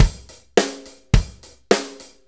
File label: 4-4 Loop, Drum Loops, Full Kit Loops; Drum Tools